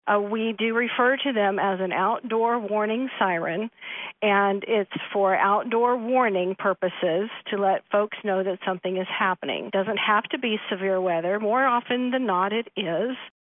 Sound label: Sirens